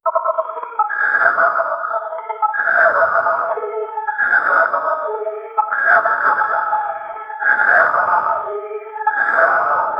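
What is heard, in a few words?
paranormal activity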